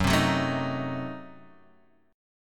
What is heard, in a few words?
Gb Diminished 7th Gb, Guitar Open D